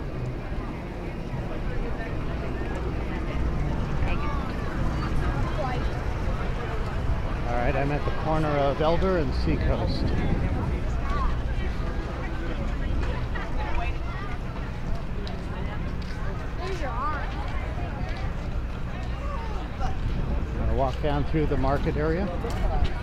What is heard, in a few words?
seacoast, binaural recordings